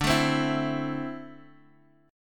D 7th Suspended 2nd, Guitar, Open D

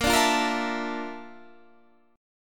A sharp Major Sharp 11th

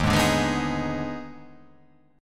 D sharp Major 7th Suspended 4th Sharp 5th

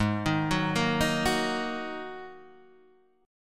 G sharp 7th Suspended 2nd G sharp Guitar Open D

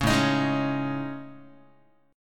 A Major 7th Flat 5th, down Guitar; AM7b5 strummed; 4,5,6,6,5,x; JGuitar

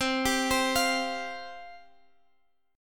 C Suspended 4th C Guitar Half Step Down

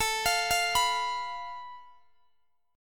A Suspended 2nd Sharp 5th, Guitar, DADGAD; Asus2#5; arpeggiated; x,x,19,22,20,21

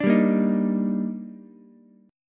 D sharp Major 7th, Ukulele; strummed; JGuitar

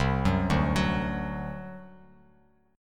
C Suspended 2nd Flat 5th C down Bass Standard; Csus2b5 arpeggiated

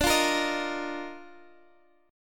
Eb Major 7th Suspended 2nd D

